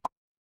ui pop

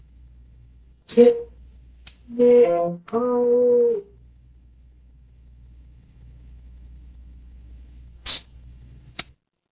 ambience room tone

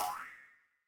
cartoon boing

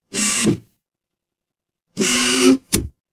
mechanical lever

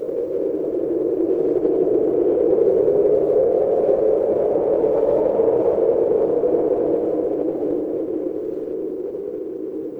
ambience wind

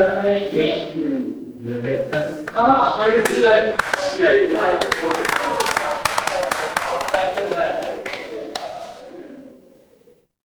crowd applause

